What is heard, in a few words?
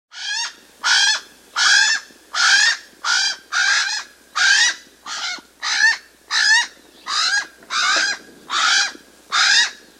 alala vocals